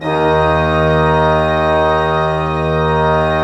PLENO D2, Cathedral Organ, PEDAL PLENO; Propeller Island

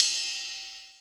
CYM, Dance Drums

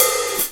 RINGIN OPN CLS; E-MU Formula 4000 Series Vol. 1; Hip Hop Nation

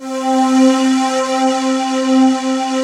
pan pad C4; PANY PAD

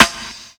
SD, Hip-Hop & R&B; USB Soundscan vol.47; AKAI

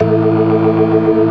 organ, all stops; Keith Emerson Signature Series

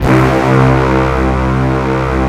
POWER BRS C1

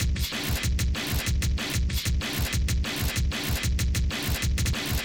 gacha drum

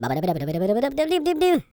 SCAT; Spectrasonics; Vocal Planet; Jazz FX; CHEESESCAT

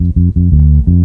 bass loop, Ragga & Dub Reggae; AKAI; USB Soundscan vol.27

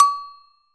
Agogo Hi; Akai MPC500; LA Perc

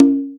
Yamaha CS6, Drums

